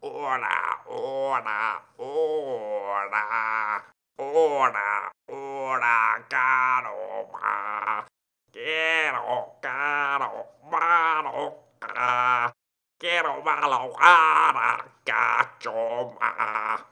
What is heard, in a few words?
sing